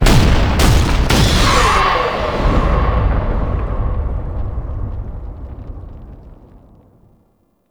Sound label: Makvoss Cannon Close; Gameplay; Warframe; Tau